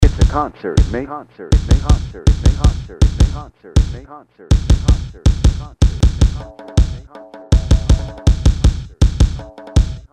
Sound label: Zero Times 80 BPM Construction Kit